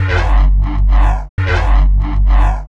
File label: Bass loops; neuro; Optiv & BTK